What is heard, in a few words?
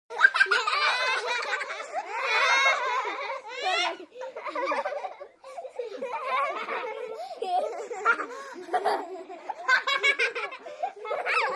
6 Yr Olds Play Laugh